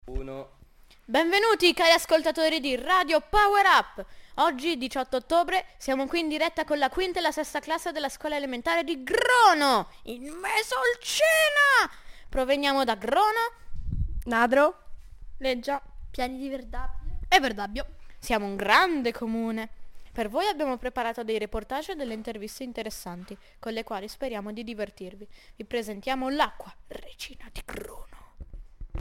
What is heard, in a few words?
jingle; Grono; powerup-radio; Radiomobile; Svizzera italiana; Scuola primaria Grono